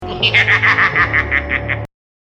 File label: laugh; Skeletor